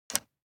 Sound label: DICE on Plastic, Grab, Standard, 2 Two Dice; Samples; ArticulatedSounds; DICE Sfx Party (Extended)